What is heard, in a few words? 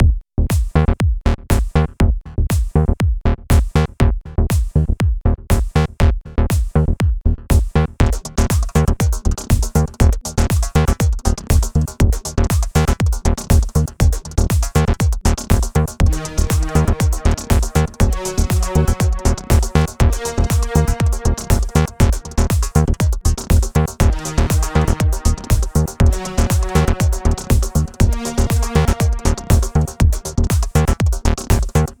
LFO VCF